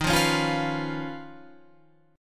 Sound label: D sharp 7th Suspended 2nd Sharp 5th Guitar Open F; strummed; JGuitar